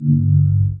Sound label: Teleporter; StarTrek